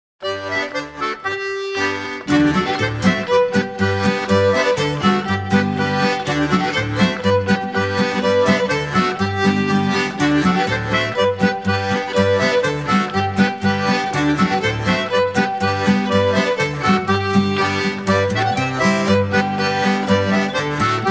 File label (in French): La Chapeloise contredanse anglaise